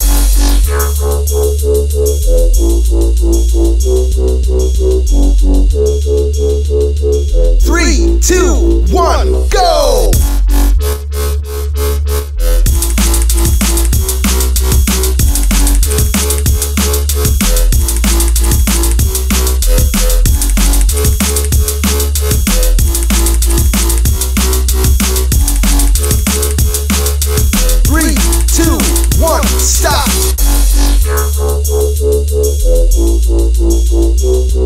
workout music gym tabata songs tabata song for beginners with timer coach